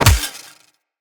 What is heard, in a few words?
Melee Fist Impact Robotic Flesh